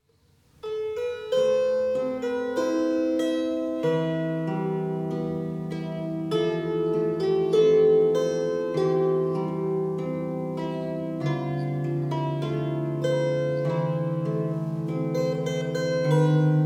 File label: Instrumental